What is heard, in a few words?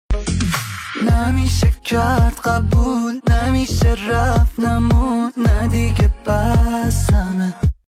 Solo Tar